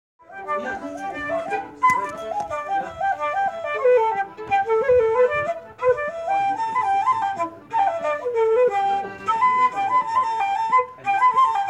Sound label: Irish rookery reel